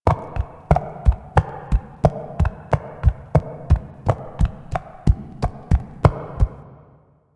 Horse Steps Trot Loop Concrete; Vehicles; Duviri

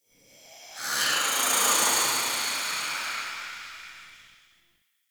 Distant Wraith Whisper; Ambience; Mendicant Area

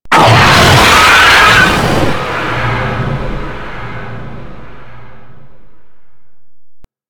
Golem Attack Roar; Warframe

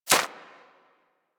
Bird Of Prey Step Dirt; Warframe; Footsteps

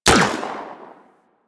Laser Fire; Warframe; Venus; Venus Terra Moa; Weapons

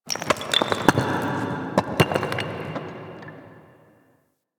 Moon Dust Fall Medium, Ambience; Warframe; Orokin

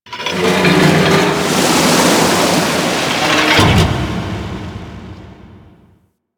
Ocean Hatch Large; Ambience; Warframe; Grineer Ocean

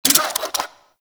Necramech Repair Drone Claw Calibrate; Warframe

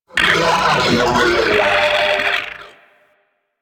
Sentient Ghost Caster Attack, Barks; Warframe; DE; Sentients; Sentient Eidolon Ghost Caster

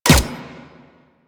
Energy Rifle Fire; Warframe; Weapons; Energy Rifle Vandal

